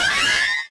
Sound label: screams alien